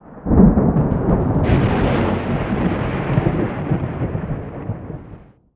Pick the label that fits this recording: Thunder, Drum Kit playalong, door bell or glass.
Thunder